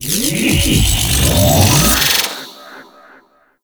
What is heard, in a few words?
Sword Finisher Glow Orb Pull; Teshin